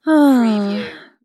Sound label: Female Sigh